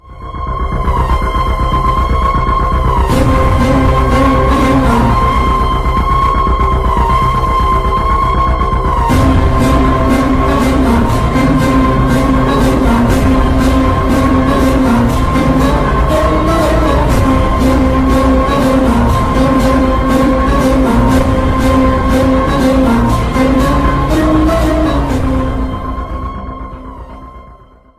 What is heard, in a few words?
Attitude Music Ringtone